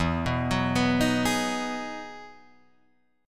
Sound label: E Major 9th E down Guitar Drop D